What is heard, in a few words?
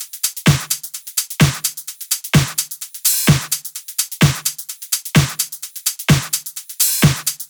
128BPM Kit; Vengeance Samples; Rocketeer Kit